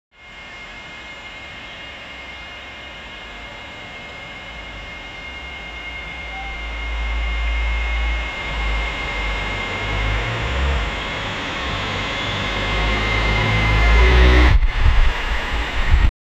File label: far away construction sounds